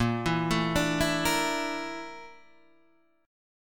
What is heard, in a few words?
Bb Major 7th Flat 5th, Guitar, DADGAD